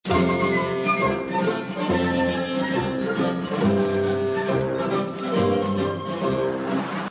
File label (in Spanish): musica comedia